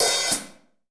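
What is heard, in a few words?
HH, hi-hat; Best Service; Hyper Dance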